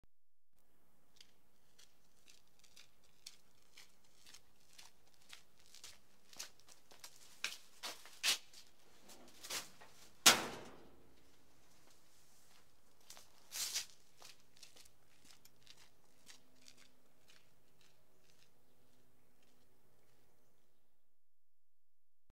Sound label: streetside; archipelago; town